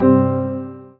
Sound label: Low Battery